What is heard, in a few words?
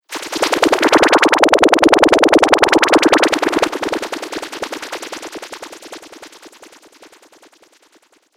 Laser Future Frequency Hiss; Sci-Fi Laser Sound Effect; Laser Sound Sound Effects